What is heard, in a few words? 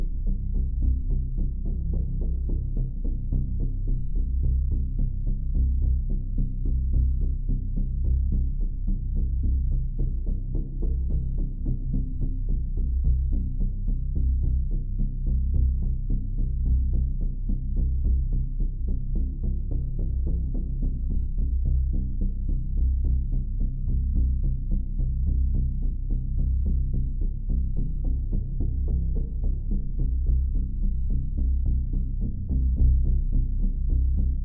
warden, ambient